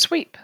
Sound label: sweep longer